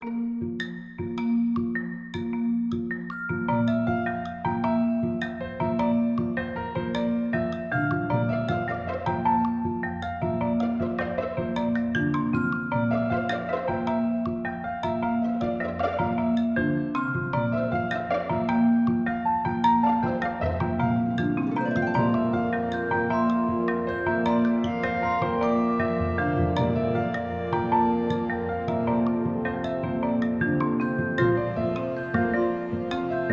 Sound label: music; ambience pet rock